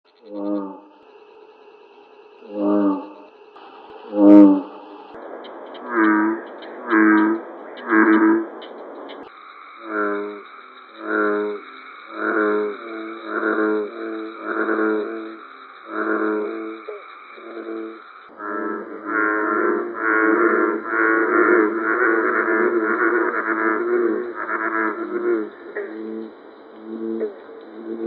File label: Bullfrog